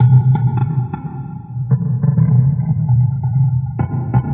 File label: Troglodyte Beat, Acoustic Beats; Sonic Foundry (Sony Creative Software); Myths of Technology